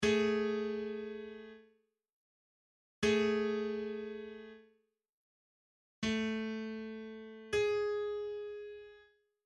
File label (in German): intervall; Musik; Realschule